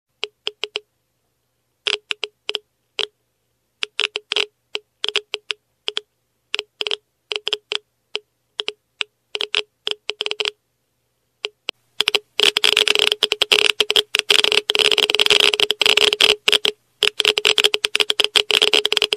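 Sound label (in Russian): счетчик гейгера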